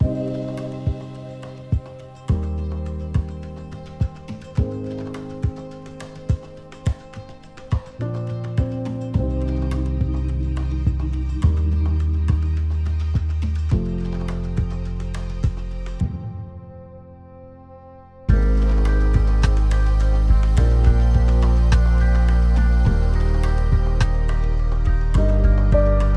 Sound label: Soundbed Calm; Aramco; sonic